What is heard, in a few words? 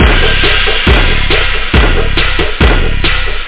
breakbeat